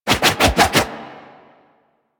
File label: Reaper Melee Charge Spin Whoosh; Warframe; Wraith